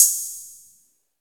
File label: Ride Cymbal Sound G# Key, ride cymbal drum sample tuned to the G# note; one-shots